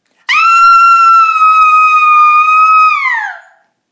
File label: Screaming